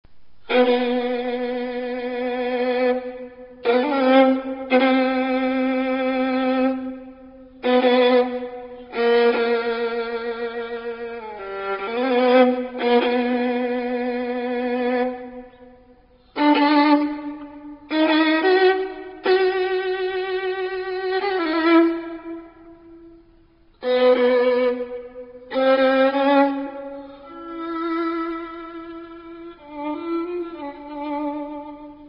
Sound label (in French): violon; ravel